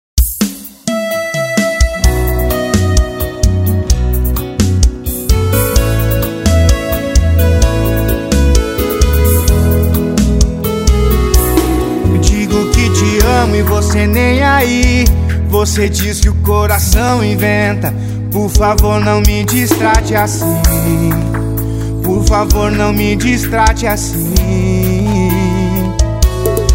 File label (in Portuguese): arrocha sofrencia